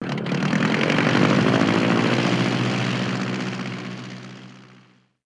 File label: PROP; zones; Airport